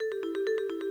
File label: Reel Spin; ClubFusion; Showtime Classic; Vibe